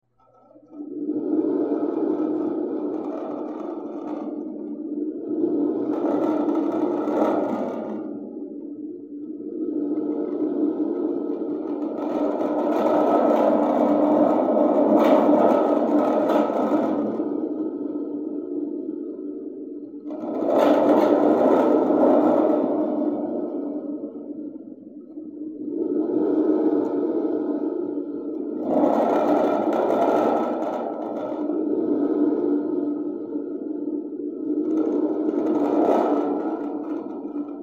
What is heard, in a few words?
spring Drum